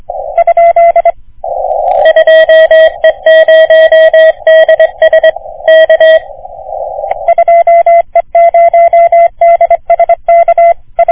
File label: CW 3.5MHz; RSGB 80M CC